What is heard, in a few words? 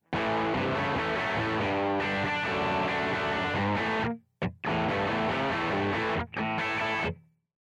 dirt fat, guitar; LLV 66 PRINCE BLK